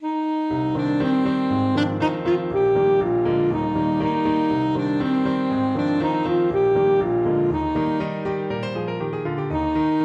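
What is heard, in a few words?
alto saxophone; janitscharen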